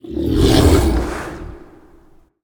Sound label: Dreaming Drake Attack